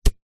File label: sport soccer ball single bounce on grass